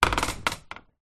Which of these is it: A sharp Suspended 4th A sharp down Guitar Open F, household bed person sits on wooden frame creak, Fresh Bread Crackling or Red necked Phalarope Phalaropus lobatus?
household bed person sits on wooden frame creak